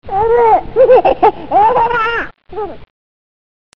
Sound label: smiling baby